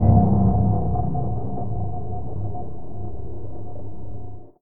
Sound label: Engine off